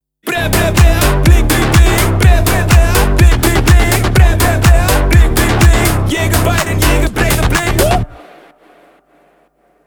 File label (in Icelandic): hringitónn